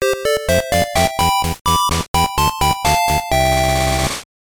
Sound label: Jingle, GBC; Sylvanian Families Otogi no Kuni no Pendant